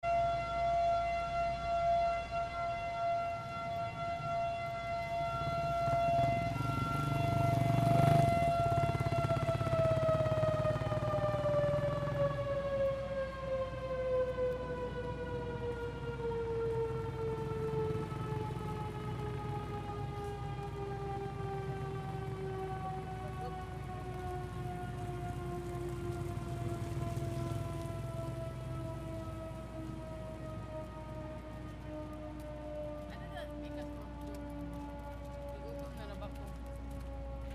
Typhoon Siren 10pm Dumaguete Philippines

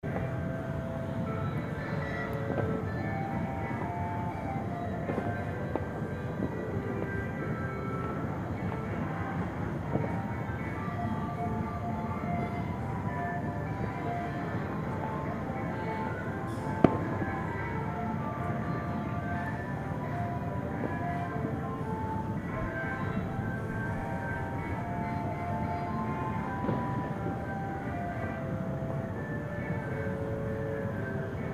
Church Songs at Night in Lome Togo

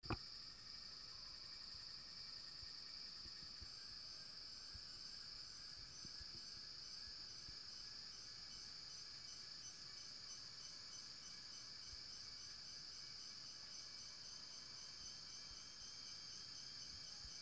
Sound of the Forest Bee Philippines

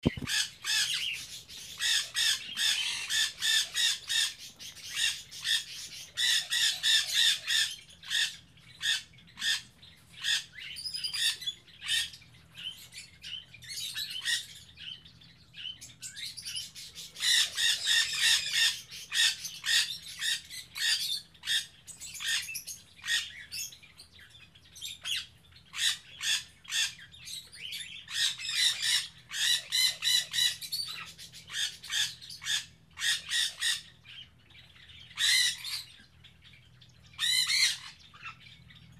Birds Aviary Spetses

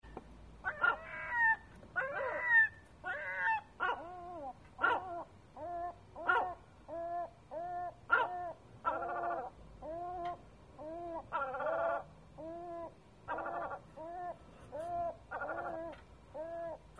Seagull on the roof 3am Sète

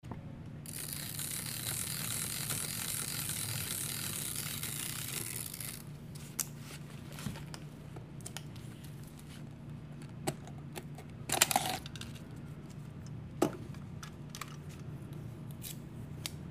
Hasselblad Camera Film Change Paris

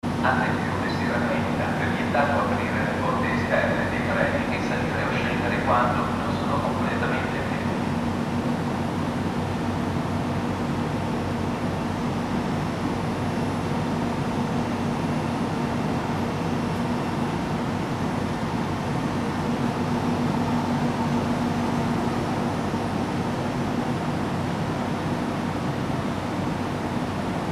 Train Leaves From Torino to Genova

